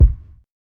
00s fluffy bass drum one shot d sharp key, kick single shot tuned to the D# note